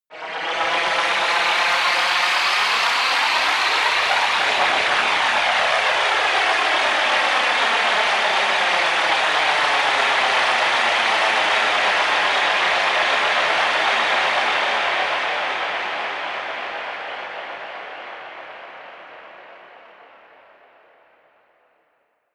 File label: razor driving beam, Risers; whooshes rises